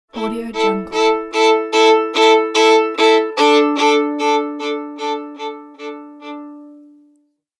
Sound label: cartoon dangerous staccatos